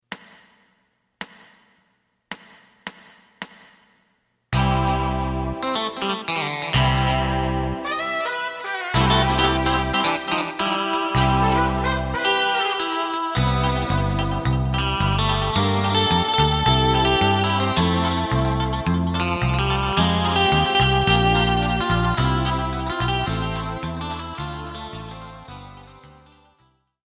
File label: drum